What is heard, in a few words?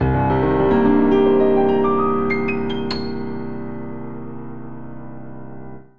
Ending Wide Melodic Arp C Minor 120bpm, Grand Piano; MIDI Clips; Ableton